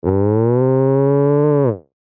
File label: cow